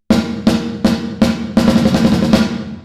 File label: Snare; smart reverb